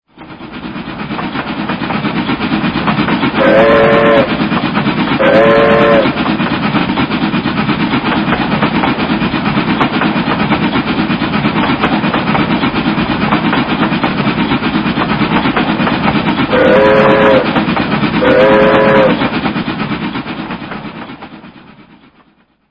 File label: Train